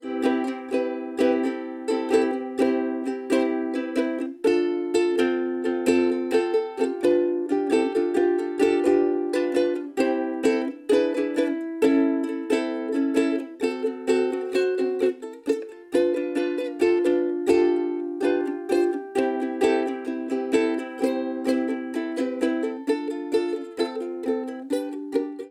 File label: ukulele